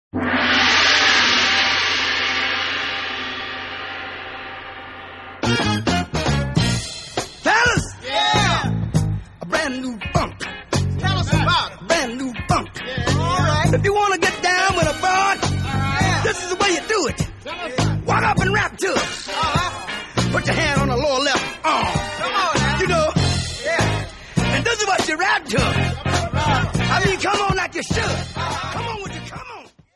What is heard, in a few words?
70s funk